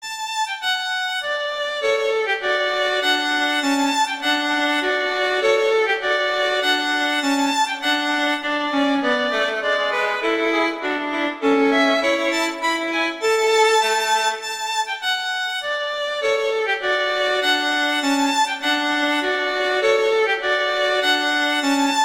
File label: Duet; Mozart Duets